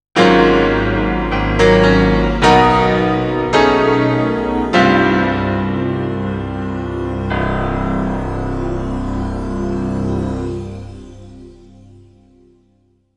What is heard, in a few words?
Startup Sound; DARTSLIVE